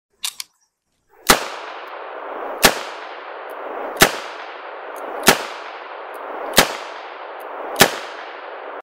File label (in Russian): sw model 10 podrjad obojmu